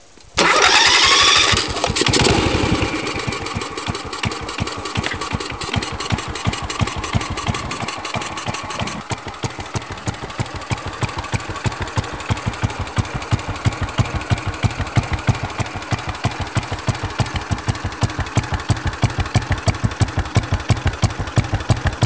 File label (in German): R34-4 odeon8 e start